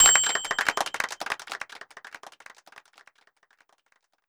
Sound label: applause; Heaven Studio Plus; mr Upbeat